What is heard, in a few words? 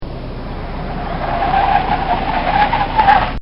Volkswagon Hard Braking; Transportation; Bobby Cole